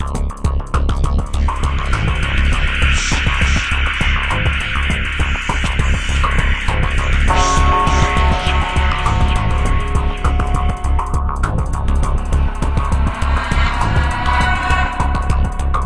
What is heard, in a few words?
Tower Music; pixelworlds